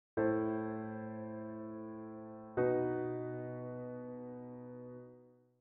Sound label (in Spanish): semi mi m; cadencias